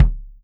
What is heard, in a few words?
kick, Salsa Kit; E-MU Producer Series Vol. 7 – Old World Instruments